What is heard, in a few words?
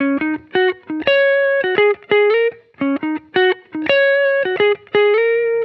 Electric Melody Loop 170 BPM F# Maj; Cymatics